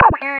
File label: ELECT FX; USB Soundscan vol.27; Ragga & Dub Reggae; AKAI